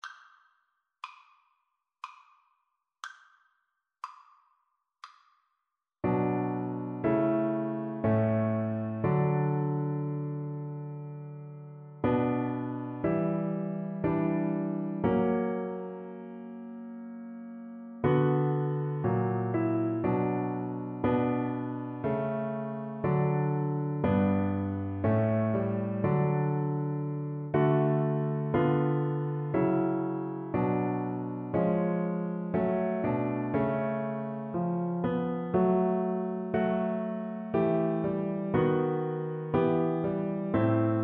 bach come sweet death viola

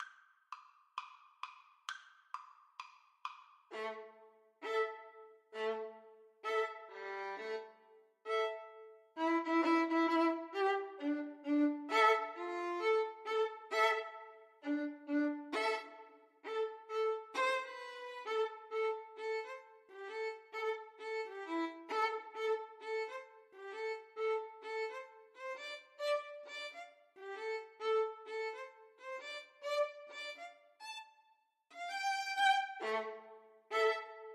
hip hop house, Violin Duet

